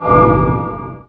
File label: Collision